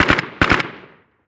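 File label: gun ak47 distant; ModernWarfare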